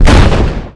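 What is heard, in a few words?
explosion metal armor vehicle close; Explosions; Call of Duty Modern Warfare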